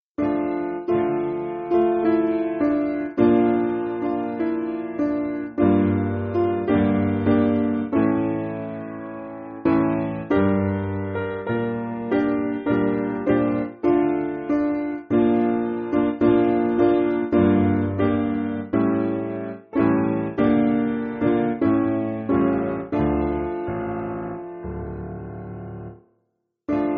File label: Piano